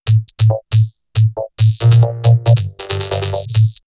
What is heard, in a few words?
Vocoder Loop; X-Static Goldmine; VocoderLoops